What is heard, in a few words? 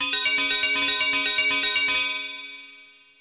Bells